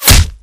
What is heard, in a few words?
bullet light ballistic flesh; Titanfall 2